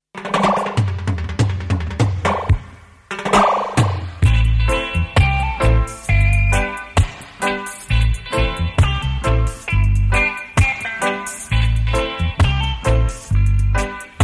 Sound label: Dub Up, reggae; dj-style